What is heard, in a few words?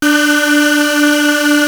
Prophet 5 D5; Hollywood Edge - Giorgio Moroder Rare Synthesizer Collection